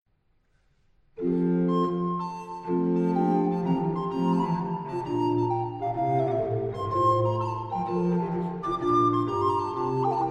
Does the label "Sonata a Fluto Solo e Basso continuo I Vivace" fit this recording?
Yes